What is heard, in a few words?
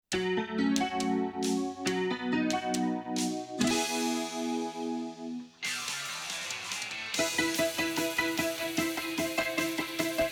Acapella; Gintama